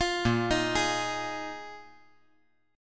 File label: A sharp 7th Suspended 4th A sharp